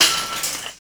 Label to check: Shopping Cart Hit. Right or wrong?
Right